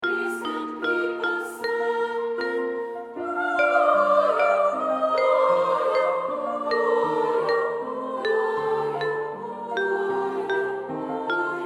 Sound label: SSA Sopran; cantaarte advents und weihnachtslieder 2025